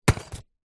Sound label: household pouch small contaning metal manicure tools set down on dressing table